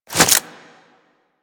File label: Equip, Weapons; Warframe; Furax